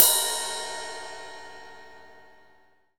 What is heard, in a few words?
RIDE, MONO RIDE CYMBAL